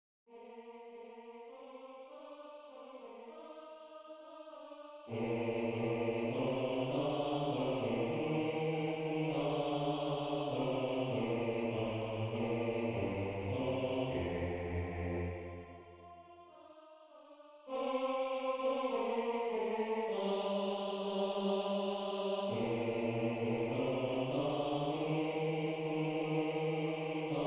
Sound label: mend bass